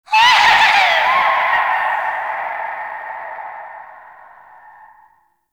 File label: Paint Pickup Laugh; Gameplay; Warframe; Shadowgrapher